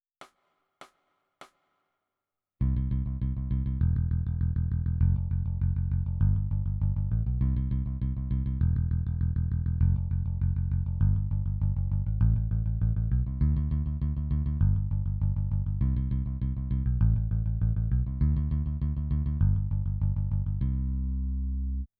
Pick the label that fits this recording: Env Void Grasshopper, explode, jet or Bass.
Bass